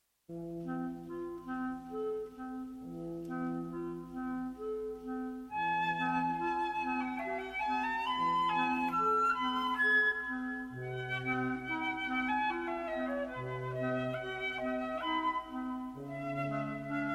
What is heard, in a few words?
Menuet; Jubilee